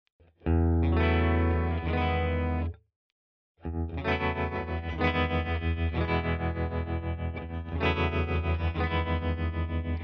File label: Walrus Fundamental TREMOLO Sine fast